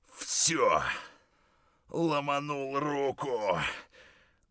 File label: broke hand; Escape from Tarkov